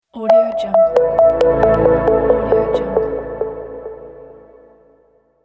inspiring hi tech logo music

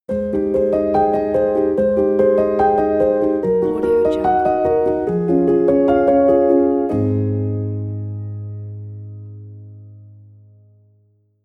cinematic piano logo music

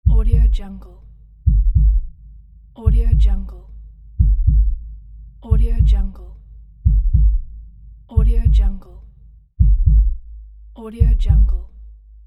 heartbeat sound

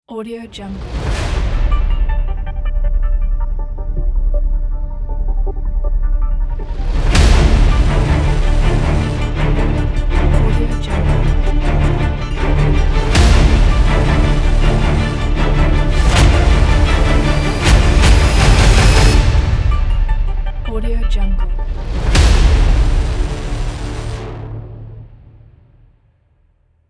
cinematic logo ident music

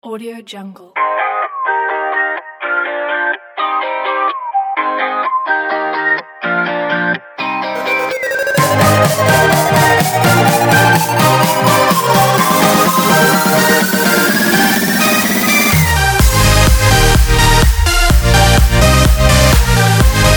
summer party music

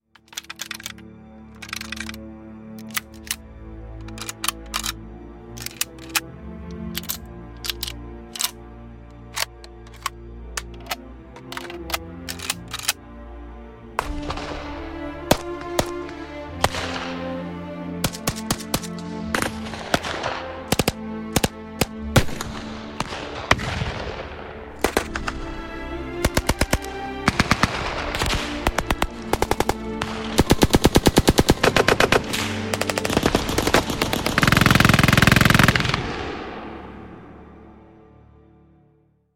world war ii firearms construction sound kit; boom library

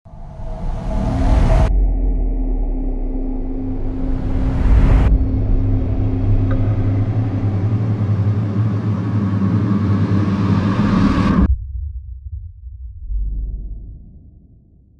filmmaking essentials sound fx; division

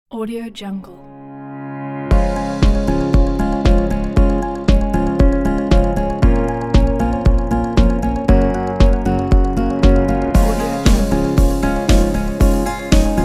positive rhythm inspirational music

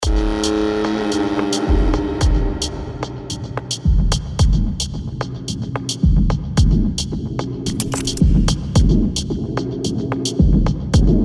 black hole dark atmospheres sound fx; ghosthack

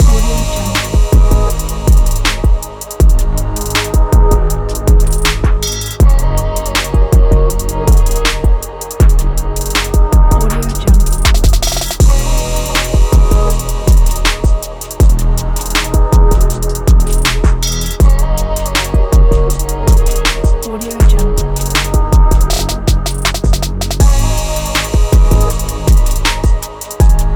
sad emotional hip hop music

